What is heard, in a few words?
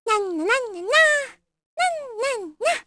Vox Hum; Hanus; kingsraid